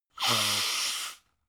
nose blow; soundpool

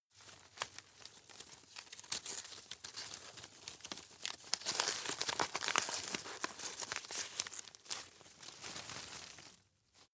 rustle medium long; berufe; foerster

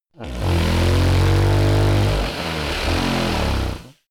monster snore heavy low; comedy-cartoon; koerpergeraeusche

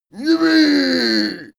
male cheer yippieh

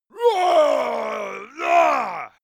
monster male growl aggressive; kreaturen

